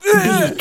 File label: voice male grunt pain